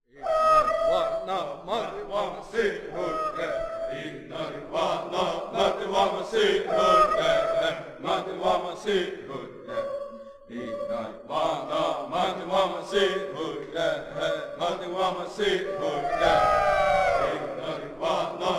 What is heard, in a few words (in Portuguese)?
canto de caca